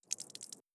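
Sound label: blood small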